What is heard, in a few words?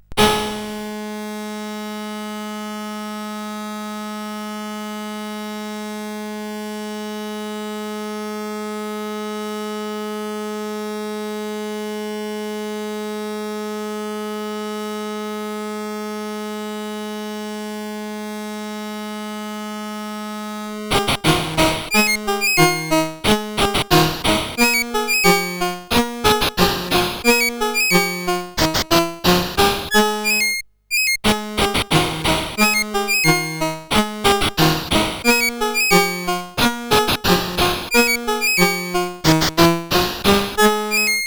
sid CSG8580R5; Bird Mother